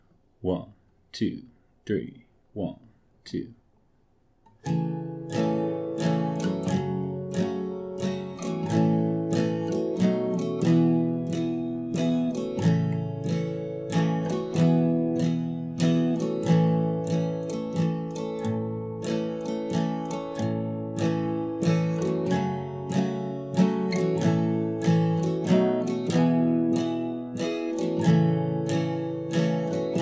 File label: star spangled banner backing track a major